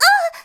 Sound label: Surprised; Zelda surprised (touching the Triforce)